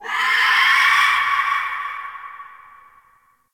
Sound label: Night Distant Creature Small; Warframe; Ambience; Eidolon Plains; One Offs